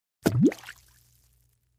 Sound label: Falling fruit in water